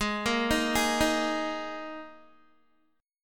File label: Ab Suspended 2nd Guitar; arpeggiated; Absus2; 15,13,15,13,15,x